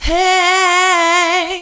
heyyy, female vocals; PlatinumPhattCD2; E-MU Producer Series Vol. 8 – Platinum Phatt

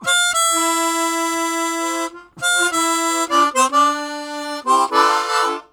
Minor Chords, Harmonica; Zero G

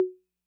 Congas Hi Accent Min Decay, 808

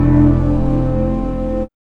BREATH

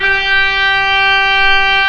REEDS G4, ORGAN

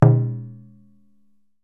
DJUN DJUN, African Perc; E-MU Producer Series Vol. 7 – Old World Instruments

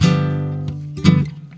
GUIT CHORDS; Best Service; World Colours

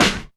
Snare, Alesis DM5 Drum Machines

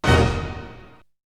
Hit; ZERO G - Datafile DISC 1

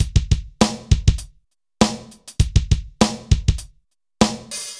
dry Loop, BPM 100

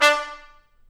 BRS TP, Roland, Stabs; Roland L-CDX-03

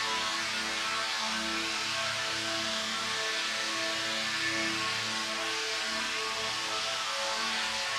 Drone; stereo toolkit samples; Non Tempo Loops

